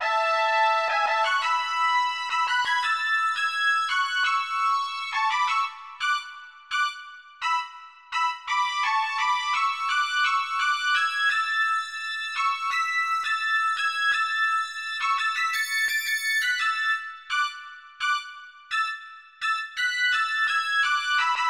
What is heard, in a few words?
brass